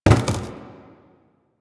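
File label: Cardboard Box Impact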